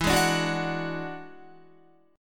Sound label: E 9th E down Guitar; E9 strummed